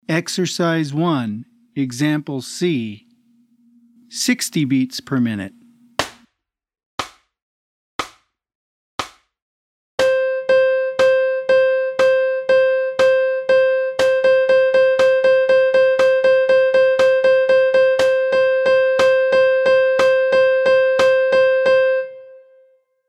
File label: EXERCISE 60 BPM